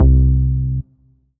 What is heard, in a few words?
Korg N1R Dance Bass C2